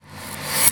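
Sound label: Reverse Tinkle Bling, Cinematic Metal Impacts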